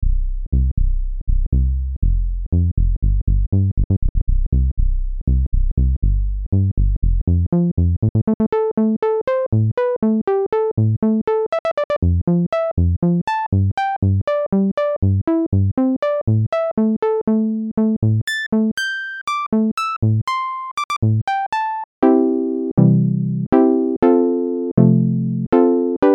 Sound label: terratec komplexer vsti filter demo lpf24